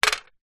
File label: leisure game plastic pigs x2 throw onto plastic board; pass the pigs; zapsplat